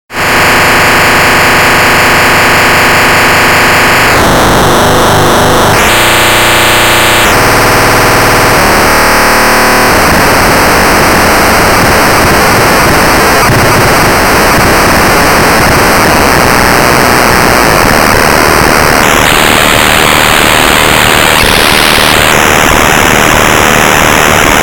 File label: rrrrrrrhmmmmddddeeeeerrrrrrrrrrrrr, ringtones